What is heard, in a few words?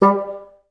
BNS2STAC G2, Advanced Orchestra; Best Service; Peter Siedlaczek's Advanced Orchestra